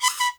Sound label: HUNT PIPE, ZAIRE PIPE; Spectrasonics; Heart of Africa 1